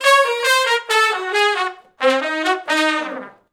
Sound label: R B Riff (Eb) unison, Horncraft; Sections